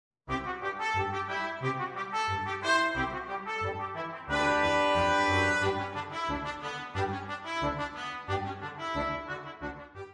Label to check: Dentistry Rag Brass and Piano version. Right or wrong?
Right